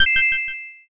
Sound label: judge perfect